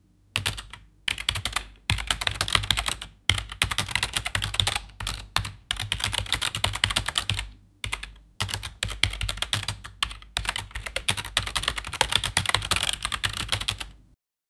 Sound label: typing on a very loud